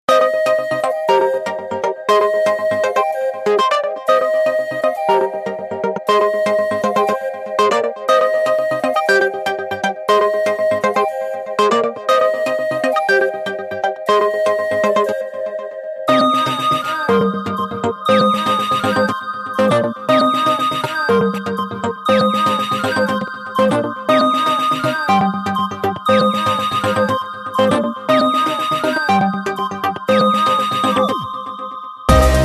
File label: pulsating rythm; Kardio Krol Pulsujace Podboje